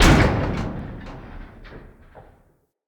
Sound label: Ship Hull Impact Small; Vehicles; RailJack; Railjack Hull Hit Effects